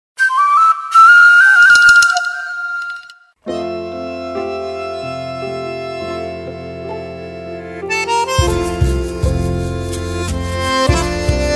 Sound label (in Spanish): Instrumental